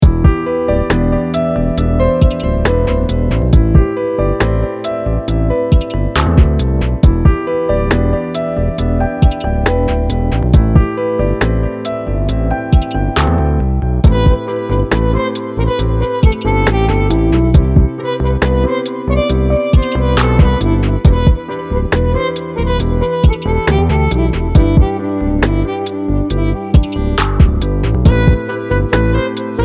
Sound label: Beat